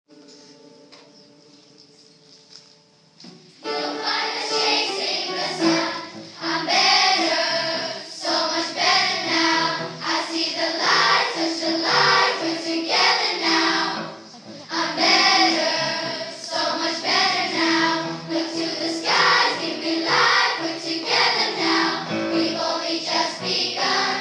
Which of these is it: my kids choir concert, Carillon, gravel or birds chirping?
my kids choir concert